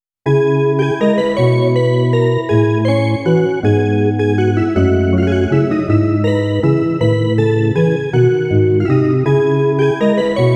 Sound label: Automatic Musical Performance Machine; Orphen Scion of Sorcery Original Soundtrack